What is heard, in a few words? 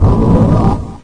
mecha; misao